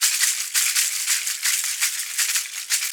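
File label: perc african shaker, shaker loops; Roland LCDP14 Africa VOL-2; BTS Perc.Loops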